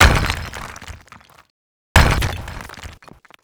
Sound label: Resynth Stone Break